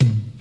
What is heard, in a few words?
old school acoustic tom single hit a key; tom drum